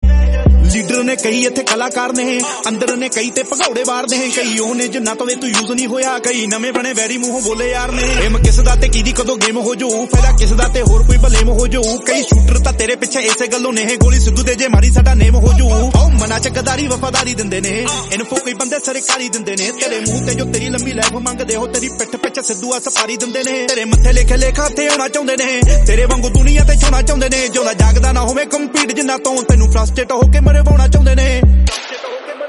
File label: punjabi song